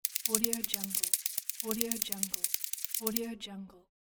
small creature fast running footsteps; cartoon sounds

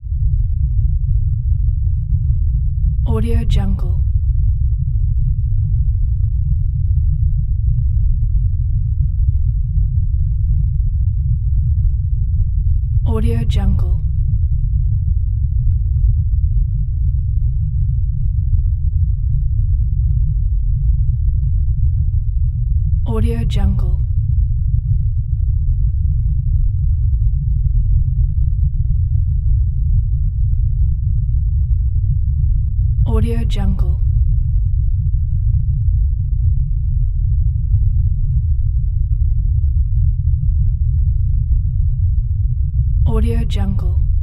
earthquake rumble; game sounds

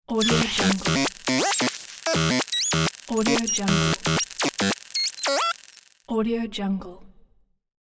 alien radio receive; futuristic sounds

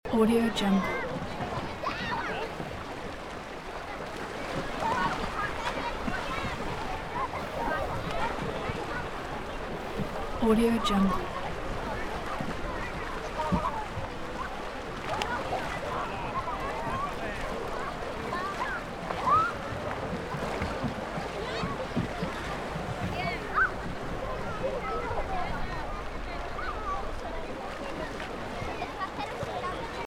people bathing in river loop; urban sounds

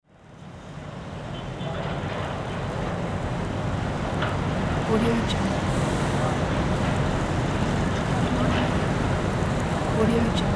urban industrial construction activity; urban sounds

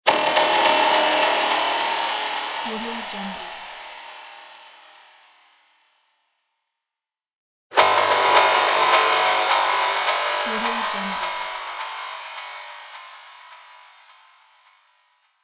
cinematic whip; transitions and movement

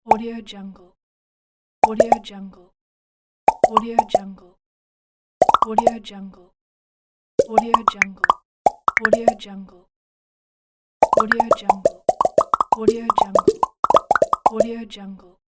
bubbles popping pack; cartoon sounds

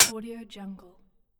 small metal hit; industrial sounds

